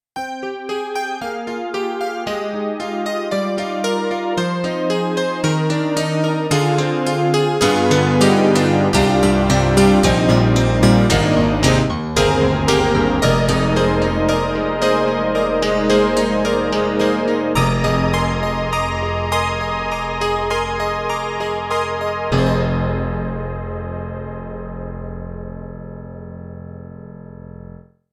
90's Piano; KORG Triton-RACK

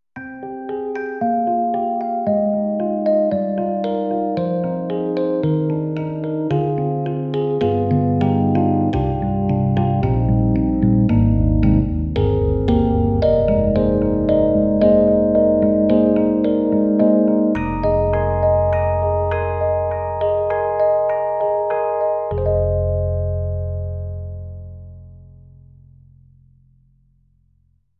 SD-90 Contemporary Soft Rhodes Wide; EDIROL

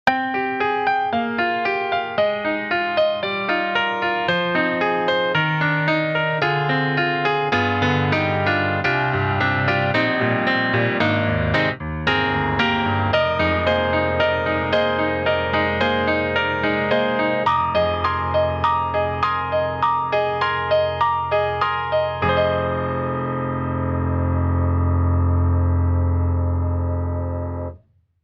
Custom C7; Spectrasonics; Keyscape; Unreal Smash

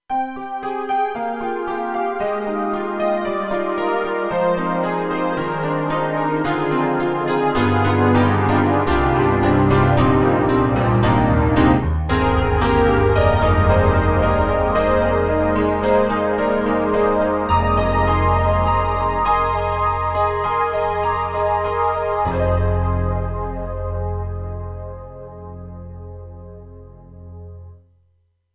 KORG M3 Piano Power Pad; M3 Version 1 Wave

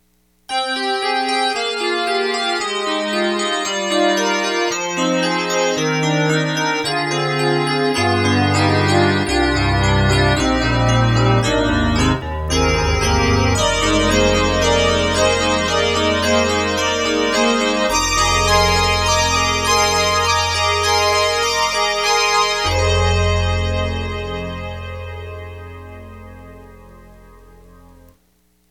Pianova; E-MU; UltraProteus

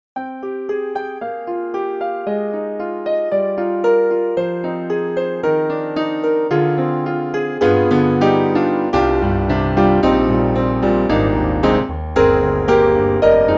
German Grand + VPM; KORG KRONOS 2

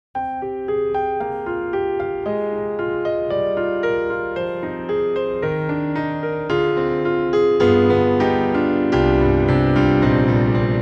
Rosewood Grand Impressionistic; Orange Tree Samples; Evolution Rosewood Grand

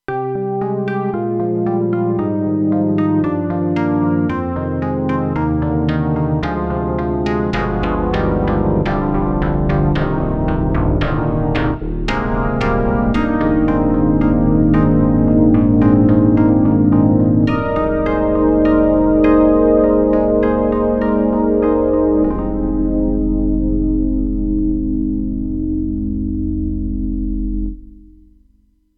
KORG Reson Piano, TRITON-Rack; EXB-PCM08

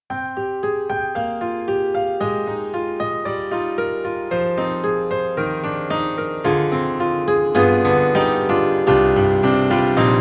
Model D Close Piano and Studio; NY; Steinberg The Grand 3

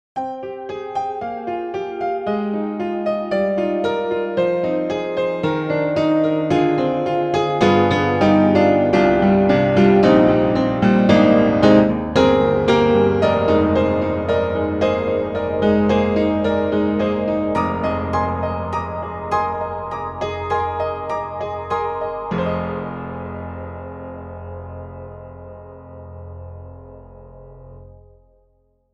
Upright Pianos Modern Upright Ambient Modern; Synthogy; Ivory II

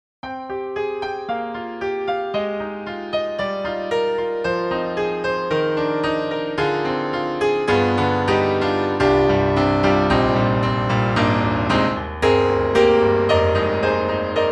Truepianos Diamond Blues, piano; 4Front

